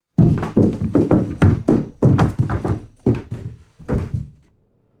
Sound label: heavy body tumbling down wooden stairs